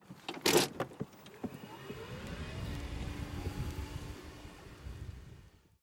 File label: golf cart stearing and moving away stereo; soundscrate